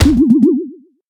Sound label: group kick; Golden Crayon Sounds